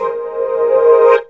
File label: Korg, FX